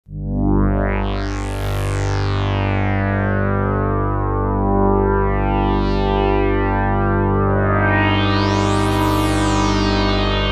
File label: DW8000 synth sound